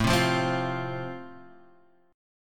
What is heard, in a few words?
A Minor Sharp 5th, down, Guitar, Standard; strummed; JGuitar